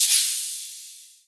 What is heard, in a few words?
Submenu Slide Off